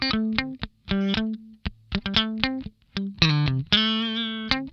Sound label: DAMPED, Funk Guitar; 90 s Sample CDs; Zero G